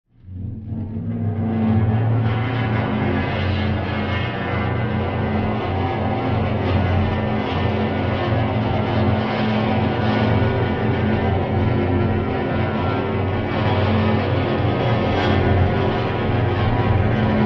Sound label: Dry Ice; Eerie Low End Grind On Metal Table.